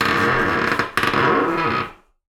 Door Creak

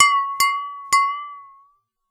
Water Clinks Mono, Glass Clink In Water

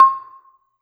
Gambang Hard Beater, Gamelan

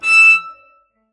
Cello D Bass

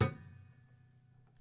Prepared Piano

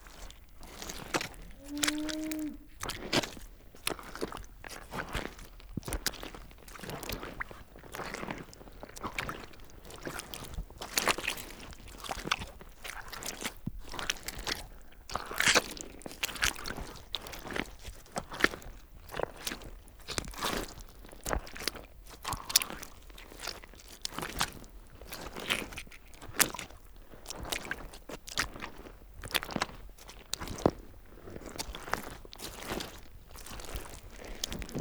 Mud

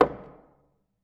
Music Stand Sgl Knock, Piano; Piano Miscellaneous

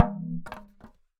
Polystyrene Rattle

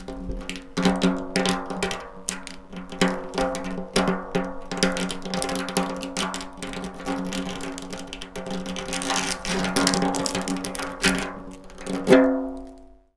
Snare Drum; Percussion Samples

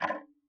Tick, Clocks Kitchen Clock

